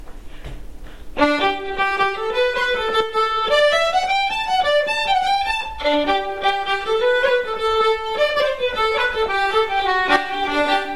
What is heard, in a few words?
Jig; Silver Anniversary